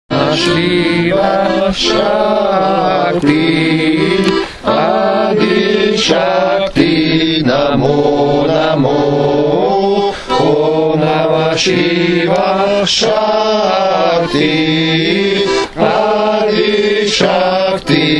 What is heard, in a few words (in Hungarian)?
Siva mantra